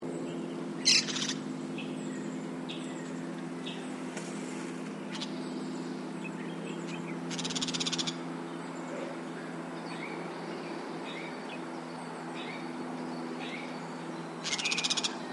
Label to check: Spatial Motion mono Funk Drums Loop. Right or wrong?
Wrong